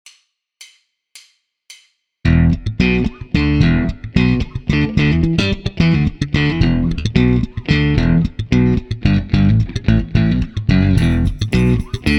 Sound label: drumless